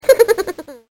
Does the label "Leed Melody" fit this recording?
No